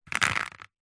nature water drips short sudden onto glossy paper bag